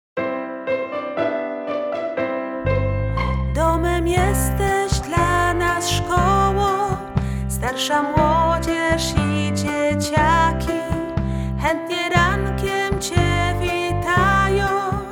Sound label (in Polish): Hymn Wokal